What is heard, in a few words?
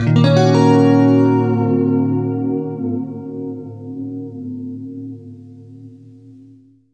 FLESH CHORDS, Guitar